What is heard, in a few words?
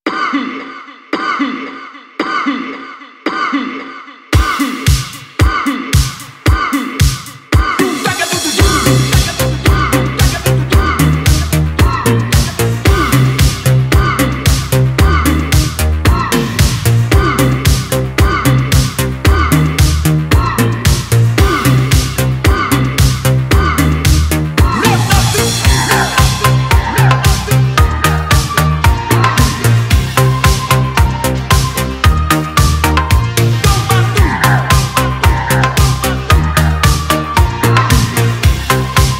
disco remix